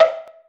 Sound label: bonk with mallet